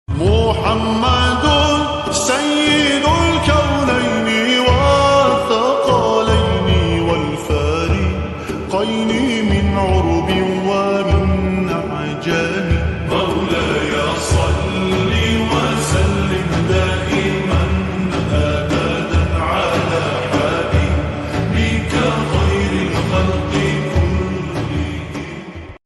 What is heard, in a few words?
lofi ringtone